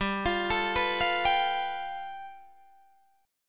G 9th, Guitar Open D

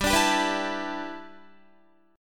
G 6th Add 9th G Guitar, chord; G6add9 strummed

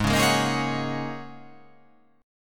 G sharp 9th Suspended 4th G sharp

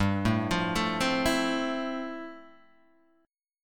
F Major 7th G; Fmaj7/G arpeggiated; JGuitar

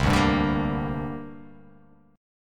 C 7th Suspended 2nd

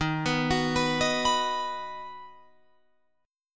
Eb Minor Sharp 5th Guitar Open F; Ebm#5 arpeggiated; JGuitar

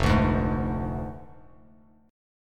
F Minor 6th F down Bass Standard; Fm6; strummed; JGuitar

